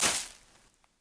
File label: object tumbleweed hit; lightning speed